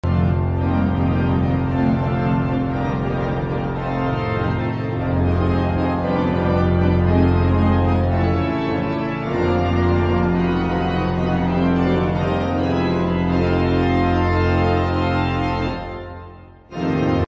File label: Organ